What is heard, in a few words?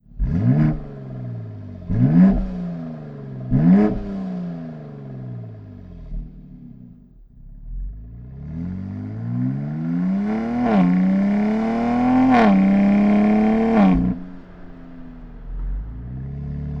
BMW X4 M Competition; SERIE